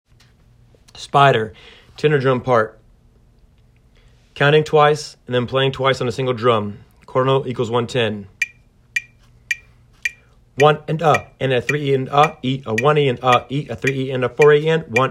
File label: spider tenor drum